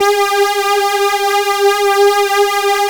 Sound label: Korg PS3100 G 5; Back In Time Records; Korg Universe VOL-1